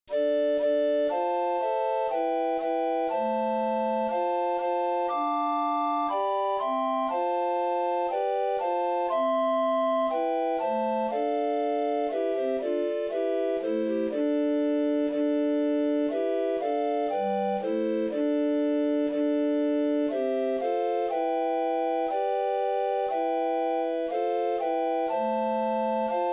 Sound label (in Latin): personent hodie, FLUTE